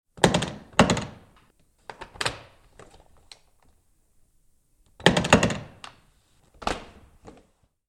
Attache Case Locks - open and close briefcase catches; Objects; Tom Hutchings